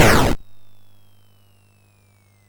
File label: Frantic, MOS6581R4